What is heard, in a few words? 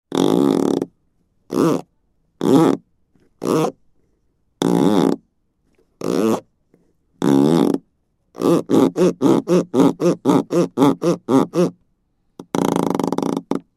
Heavy Plastic Type Squeaks and Creaks; Various rhythms and moves